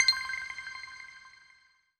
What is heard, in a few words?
count Up End; netent